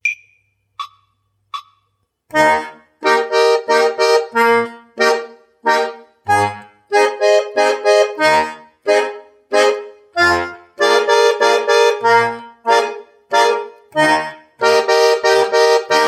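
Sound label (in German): Begleiten in Es Dur Übung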